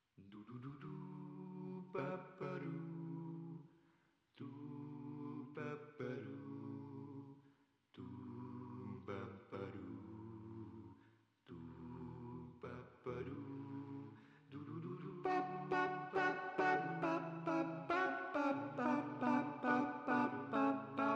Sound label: Choirs